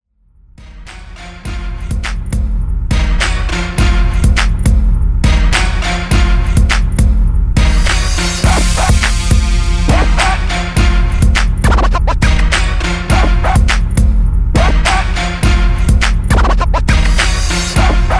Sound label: In Outro Edit 103Bpm; djyan